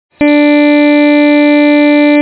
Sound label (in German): Geige D